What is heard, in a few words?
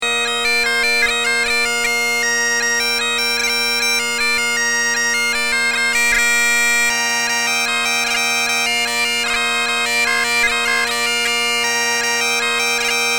mazurka, piping